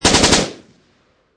Machine Gun Sig Sg 551 Short Burst Close Perspective; Guns & Military